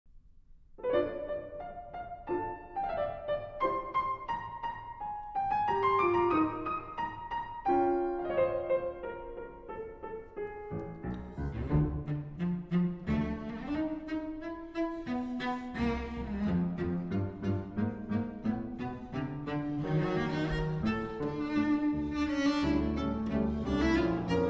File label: Sonata For Cello and Piano Allegro